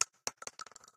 Shell Shotgun Bounce

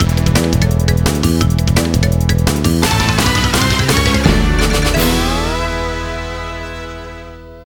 Course Intro Fanfare

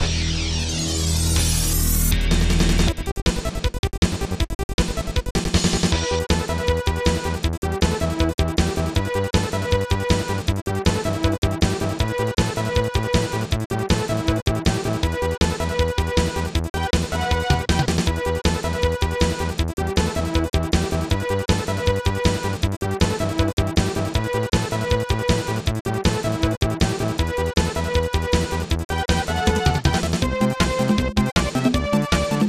Battle; hero senki snes

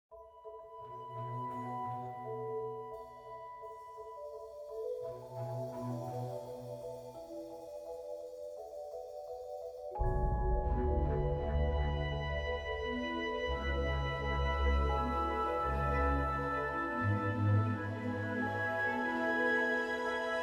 World Music

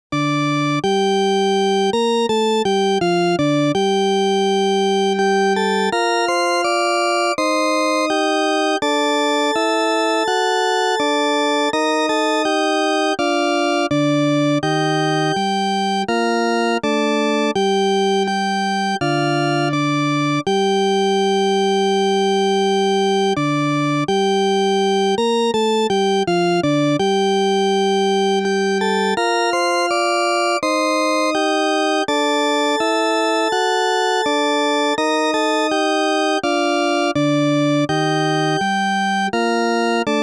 Death; koei original bgm collection volume 1

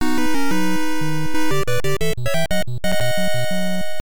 Round Clear; the tower of druaga; nes

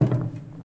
metal Walk; Poppy Playtime

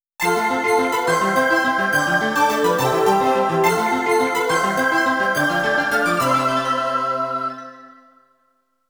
GAME OVER

